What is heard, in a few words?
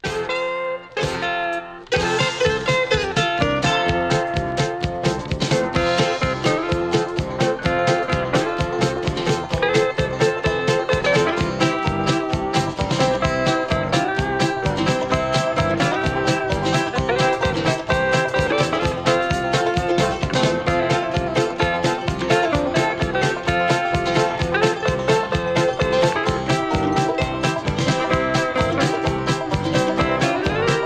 Instrumental